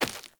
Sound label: Grunt Step Default, Footsteps; Enemy; Corrupted Sproutfolk